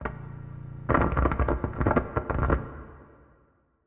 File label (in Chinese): 诡异森林氛围声; ambient